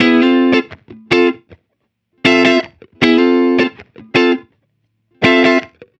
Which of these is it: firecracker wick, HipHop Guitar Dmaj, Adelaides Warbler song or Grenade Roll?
HipHop Guitar Dmaj